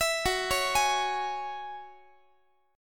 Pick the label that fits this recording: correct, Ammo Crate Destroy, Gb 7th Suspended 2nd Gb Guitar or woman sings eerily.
Gb 7th Suspended 2nd Gb Guitar